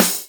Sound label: drum hit normal; osu